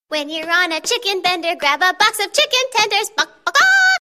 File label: Chicken